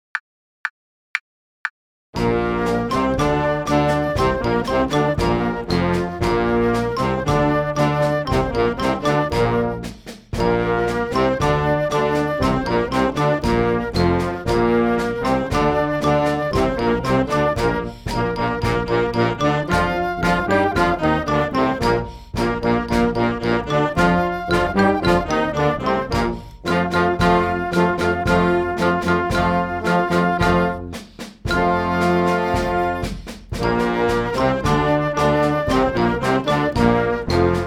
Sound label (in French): alouette noire à 120